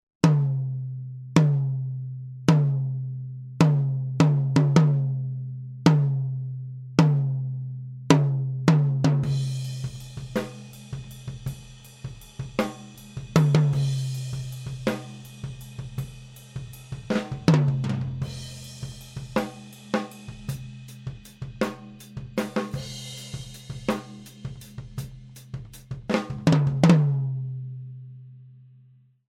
Tom Microphone U67

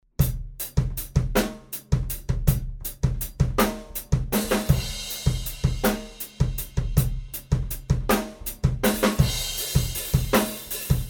Room Mics Z5600a II

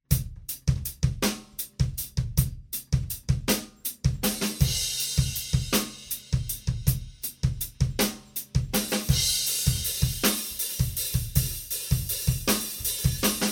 Room Mics D6

